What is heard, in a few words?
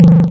failure